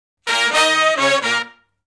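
Shuffle Blues Riff (Bb) unison, Horncraft for R&B Sections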